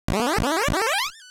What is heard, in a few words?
powerup